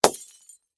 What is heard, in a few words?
bulb smash; Zomboid; tsarslib; commonlibrary